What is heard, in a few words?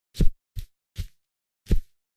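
Boxing Four Air Punches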